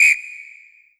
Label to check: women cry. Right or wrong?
Wrong